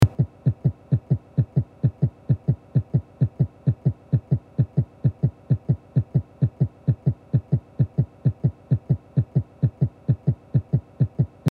Heartbeat fast - Produced with my boyfriends throat and edited in rhythm; sound production